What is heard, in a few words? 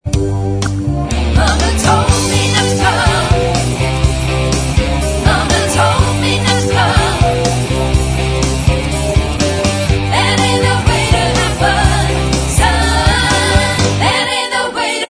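backing vocals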